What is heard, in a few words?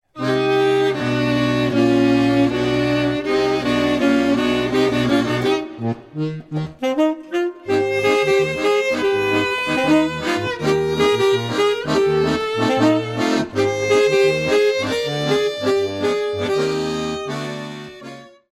bossa